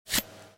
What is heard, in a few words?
cut flesh sword; Colin The Cat; wazdan